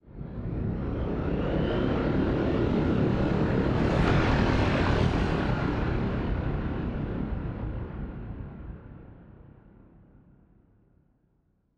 ship cruiser move; Stellaris; machineage

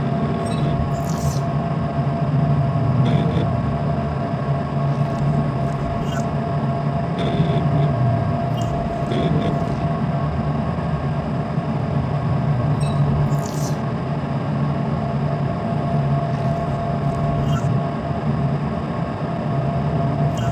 civilship, ambient; Stellaris

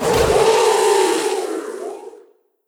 purge amb; Stellaris

